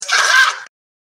haha

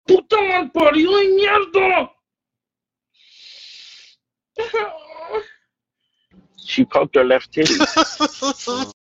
ouch

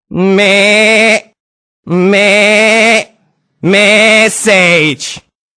message

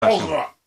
yeeeeah

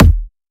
kick

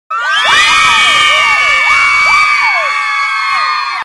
girls cheering

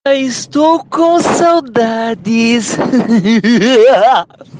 gust

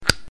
plastic

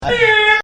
horn

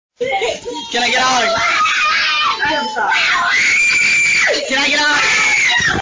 fat kid screaming

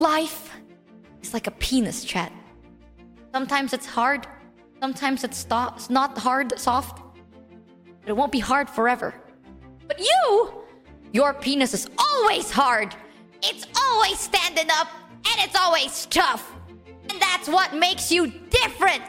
stronge clap

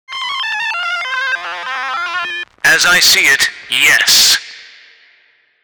8 ball sfx

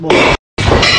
chair breaking

